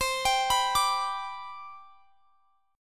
C Major 7th Suspended 2nd C down, chord; CM7sus2 arpeggiated

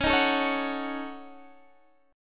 A 6th Flat 5th C sharp, guitar

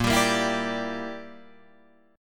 Bb 6th Flat 5th Bb, Guitar Drop D

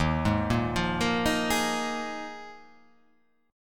Eb Suspended 2nd Suspended 4th, chord; Ebsus2sus4 arpeggiated; JGuitar

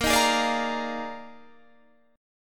Eb Major 7th Suspended 2nd Suspended 4th Bb

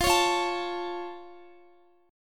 F Augmented F down Guitar; F+ strummed

